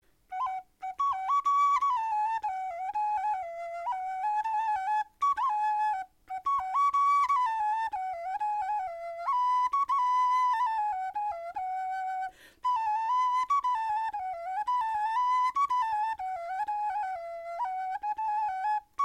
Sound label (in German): melodie, knochenfloeten